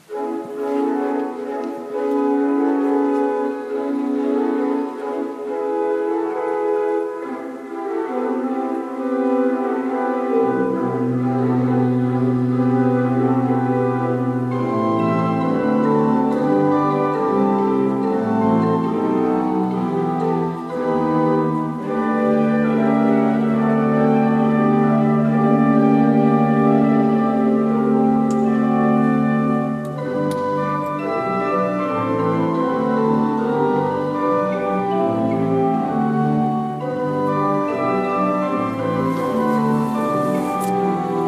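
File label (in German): Zeilhuber, pfeifenorgeln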